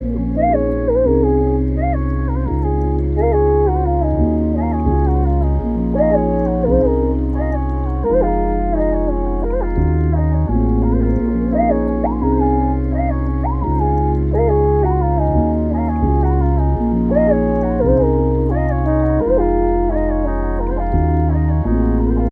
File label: melody loop warm electric piano 86 A; LAXCITY